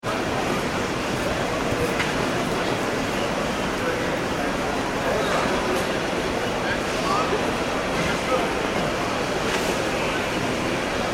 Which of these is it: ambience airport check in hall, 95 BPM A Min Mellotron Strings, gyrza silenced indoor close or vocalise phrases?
ambience airport check in hall